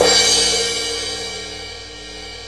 CRASH CYMBAL; E-MU Formula 4000 Series Vol. 5; Protozoa

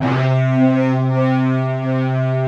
big disto; East West - Global Sounds

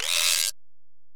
Ac Perc, Drum Machines Alesis DM-PRO

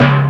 INS TIMBAL

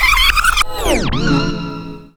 scratch; Zero-G; Freak Beats

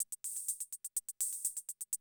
CR78 125, drum loops

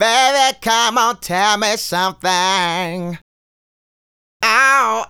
Male Solo, Hip-Hop and RnB Vocals

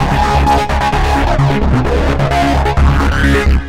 NRG Bass, Trance

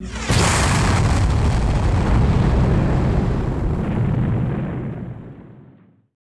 BIG EX, MORE EXPL; Total Control - Action Cutz

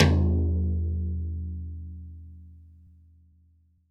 TD-20 Tom A, Roland TD-20 Toms

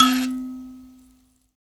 PLK Grand Mbira; Roland LCDP11 Africa VOL-1; M'biras

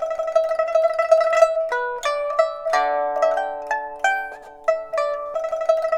PIPA, 90; INIS - Opium

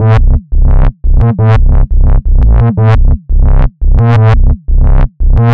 Convoy Bass, Drum n Bass

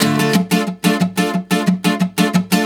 guitar, stringed instruments

